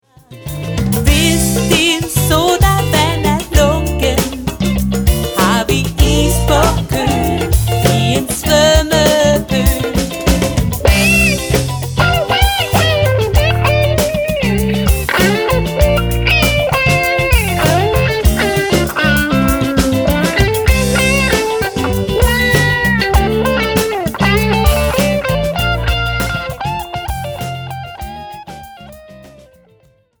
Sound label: Rumfunk